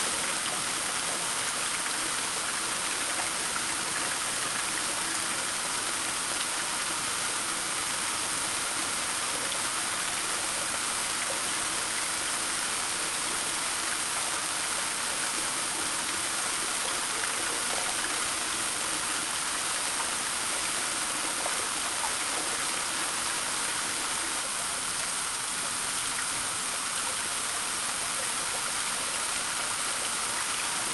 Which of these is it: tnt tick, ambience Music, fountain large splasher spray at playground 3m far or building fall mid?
fountain large splasher spray at playground 3m far